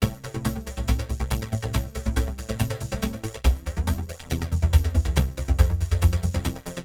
TEKNO 140; Techno2

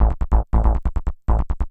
SNTH BASS ELCTR 140 A, Techno

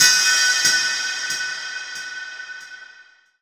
TECHNO FX 140

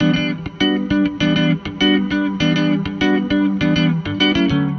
100 Cm Stepper, Spaced Out Guitars; Loops; Touch Loops; CosmicFunk

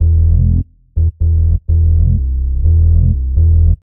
DEEPHOUSE BASS 125 A

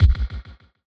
Kick; BlissedOutBeats; Touch Loops; Drums

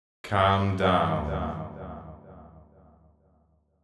deep house male, 125, A, vocals

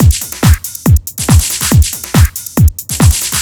TECHNO BEATS 140

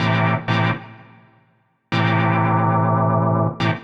DEEPHOUSE ORGAN 125 A

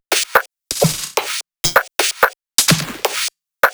drum loop 128 Perc; Electro; drum loops; Anthemic

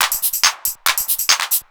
TECHNO PERCUSSION 140

